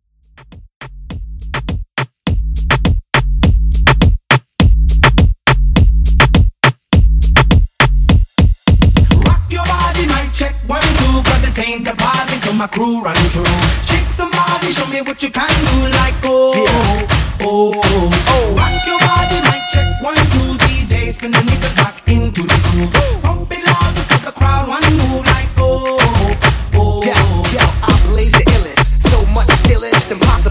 Power Moombah 103Bpm; remixplanet

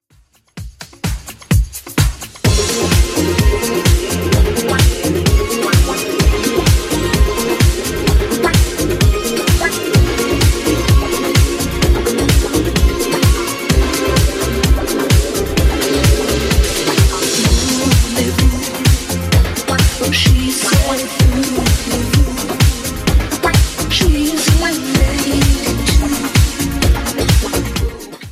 80s Redrum 128bpm

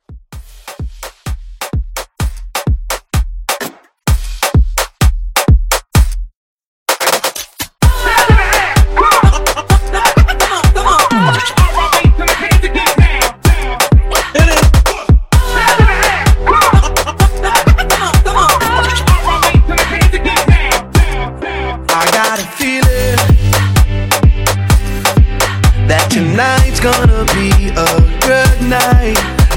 Afro Hype Rework 128

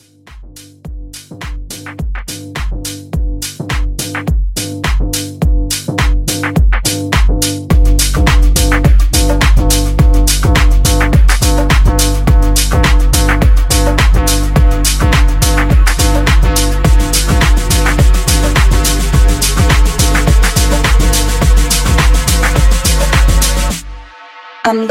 Transition 105 126

